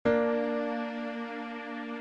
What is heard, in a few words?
Bb Maj